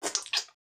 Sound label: slime; creature